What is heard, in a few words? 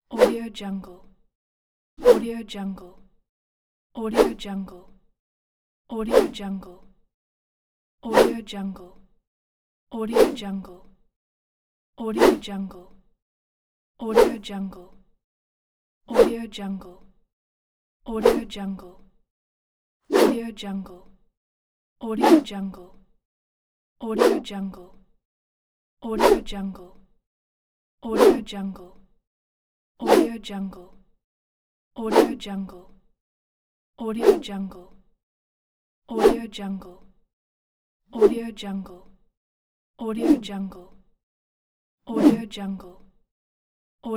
whooshing pack; inspectorj